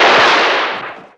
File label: western shoot; taunt; Garry's Mod; tf2